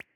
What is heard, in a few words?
Bubble Small Pop